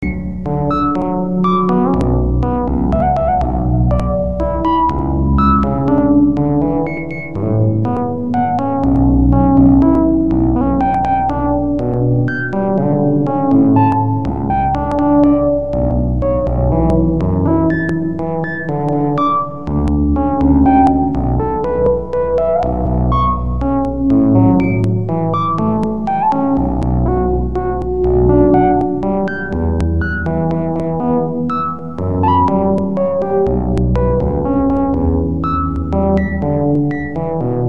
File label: synth